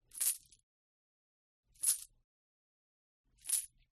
FOLEY CHAIN MAIL Armor Movement; smartsound

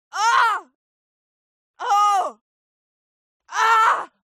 HUMAN VOCAL Female Pain; smartsound

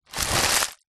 paper bag small movement; foley

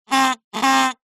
cartoon honk horn or whistle

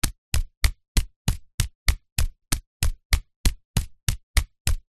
juggling balls being juggled

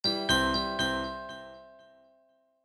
multimedia alert tone piano glock

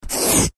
office pencil drawing line on paper hard

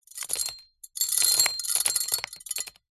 foley small stones debris drop onto metal ring

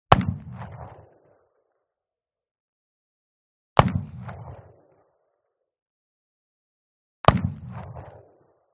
Revolver Shot 44Cal Remington 5m Rear; WEAPONS; SmartSound

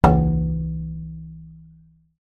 impact glass large pane resonate; zapsplat

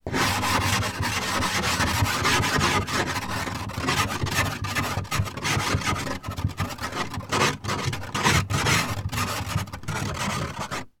ice scrape from car window internal; transportation

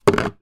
card box empty small down on table; zapsplat

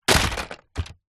impact plastic object small light

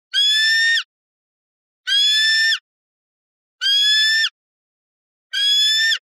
BIRD OF PREY Eagle Call; ANIMAL; smartsound

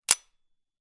gun 1911; weapon; still north media

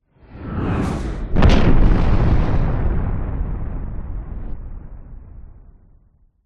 airplane jet crash explode designed; vehicles

